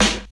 80s loud snare g sharp key, steel snare drum sound tuned to the G#